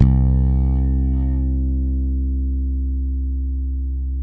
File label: FRET BUZ C, PEDULLA BASS; Masterbits CLIMAX 7 - Bass